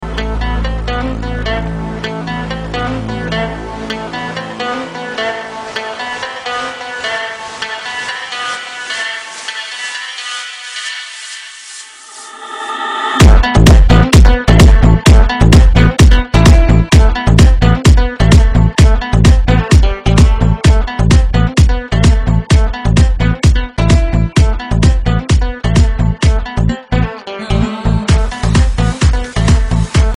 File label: guitar beat